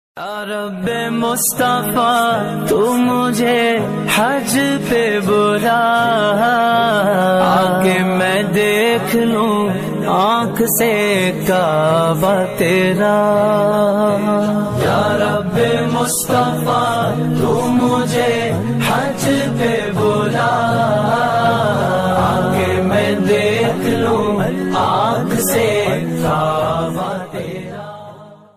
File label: islamic ringtones